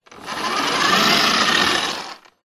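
leisure radio controlled car off push carpet short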